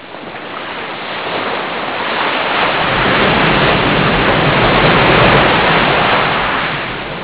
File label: sea wave